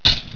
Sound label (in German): BESTECK